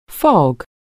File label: fog